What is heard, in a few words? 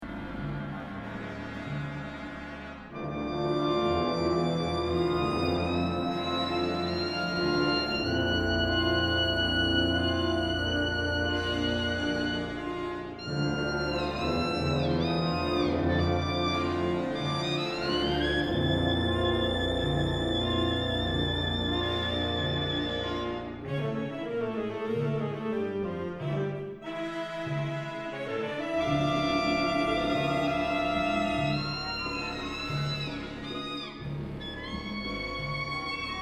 Andante Pomposos Fugue Finale; Stert Bachseits